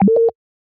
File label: Button Blip